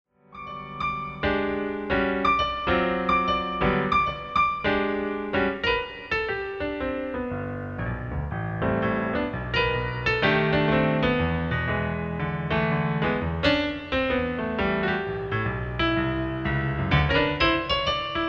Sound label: PIANO SOLO SWING